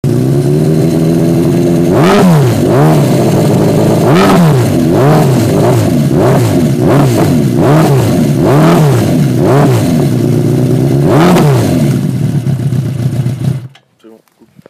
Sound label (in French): gsxr 1100